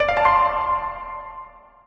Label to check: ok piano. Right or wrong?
Right